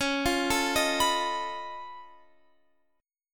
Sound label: C sharp Augmented 9th C sharp down Guitar Open F, chord; C#+9; arpeggiated; JGuitar